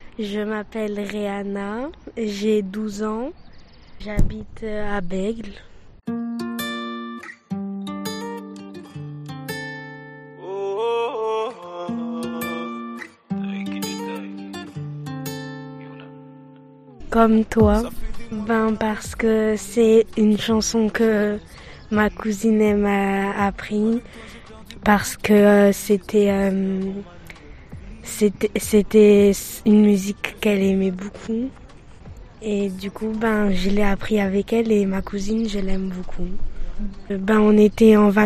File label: Trio